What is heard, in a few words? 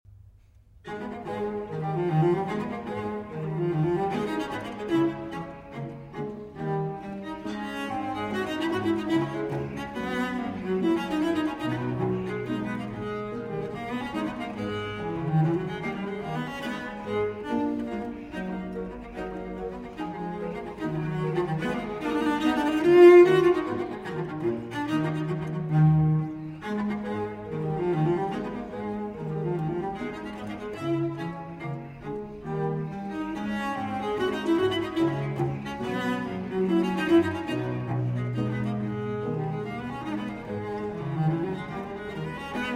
Cello Sonata in A Minor, Allegro; Vivaldi; RV 44